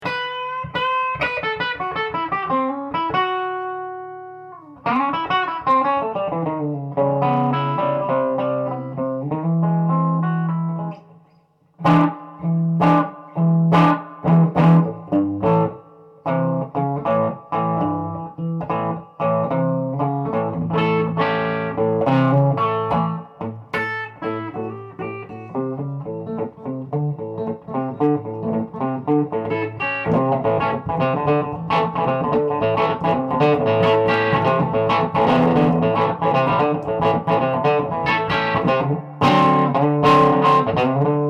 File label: silvertone h-63